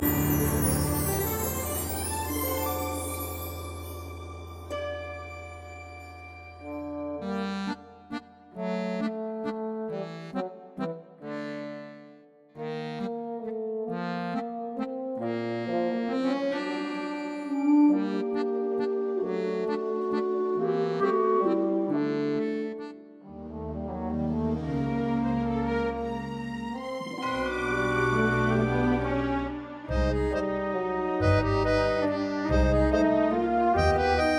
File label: orchestra; Ballad Closing; 2020 Cutlass Crew